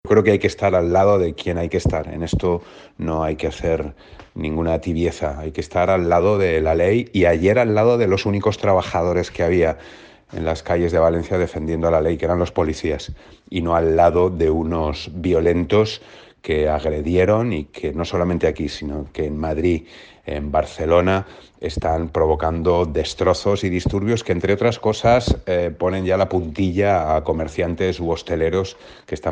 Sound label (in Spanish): Canto apoyo policia